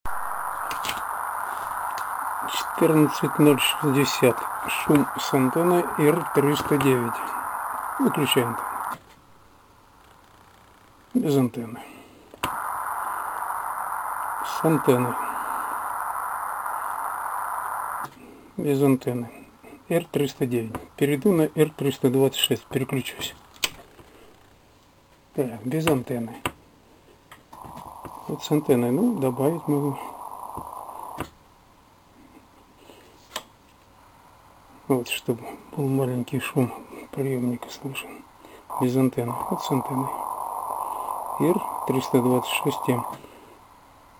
Shumy R-326M R-309

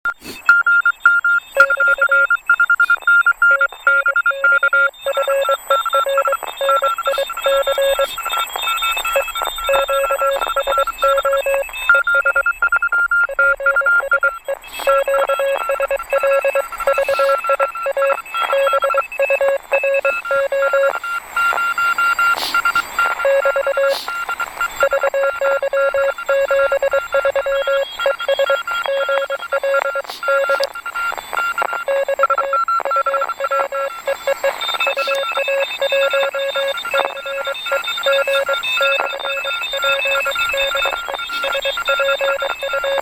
QSO DSB TRX

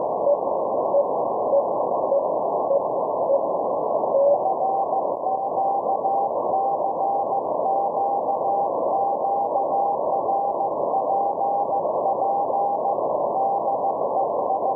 7029kHz AF

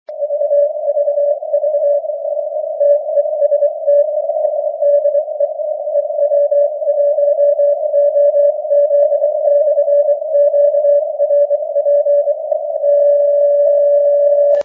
QRP

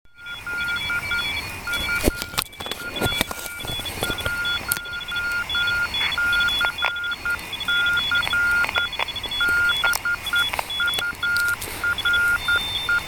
Forest

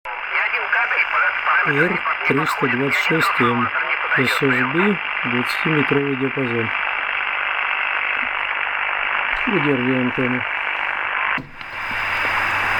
R-326M Shum

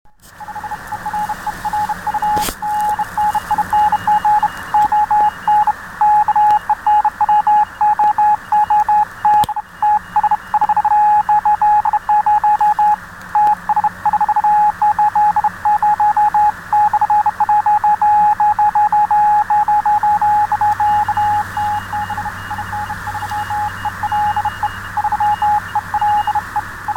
Vibroplex

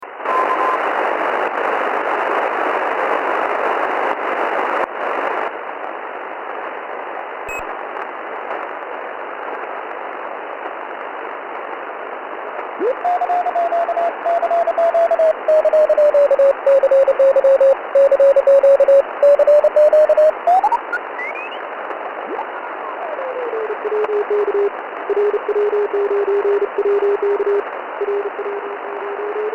CW 7 MHz

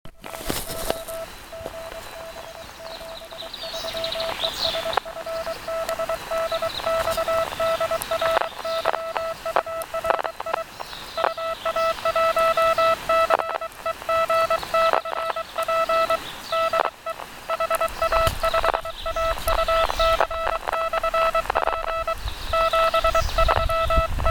RX DSB

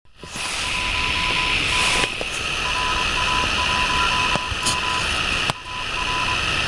CQ RX

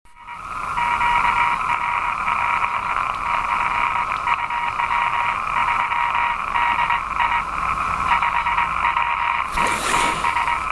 RX Home made

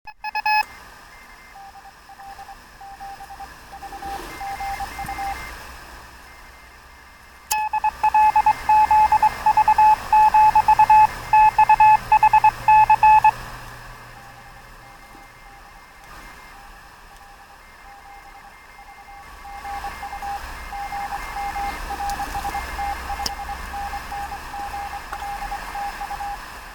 in Forest

